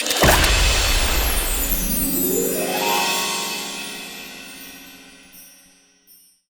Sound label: Jingle Level Clear; leo s fortune